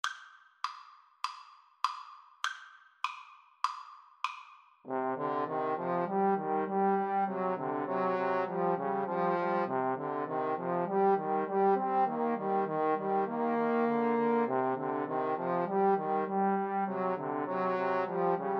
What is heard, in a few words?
trombone trio